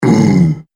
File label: Orc Effort; Monster Sound Effects; horror sound effects